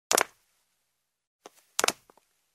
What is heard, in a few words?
Jump on the marble floor in heels; steps